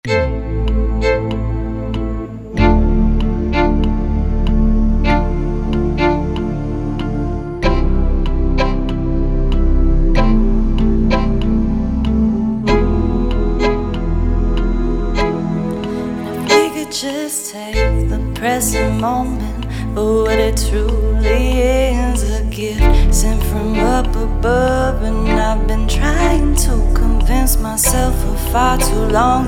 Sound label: shadow drumless click